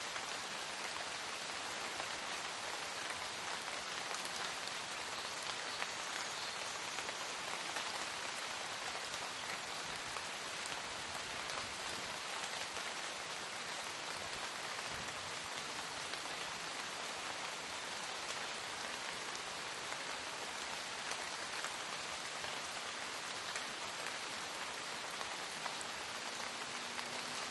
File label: Light Rain Sound